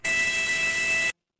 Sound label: feedback confirm